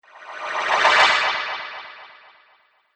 Whoosh Magic Swish; sound design

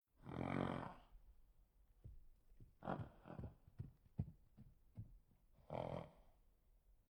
Animal Horse Angry Grunt Snort And Movement; Nature; Horses; Tom Hutchings

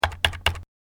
Pc Keyboard 3 Key Presses; Computer Sound Effects

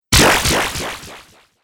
Laser Zap, Laser Gun

